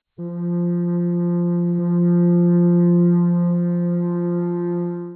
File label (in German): flöte f1 f1; Intervalle, die man durch Potenzen von 2 und 3 darstellen kann